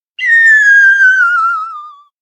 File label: elec comedy deflate; anemoi